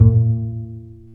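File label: String, contrabass pizzicato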